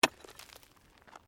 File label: Digging Pick Hard Earth; Various digging tools and processes; Construction